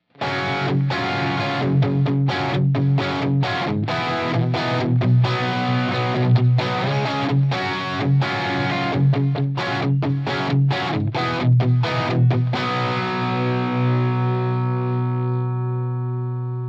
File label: Vintage Bridge Dirty, pickups; BYOG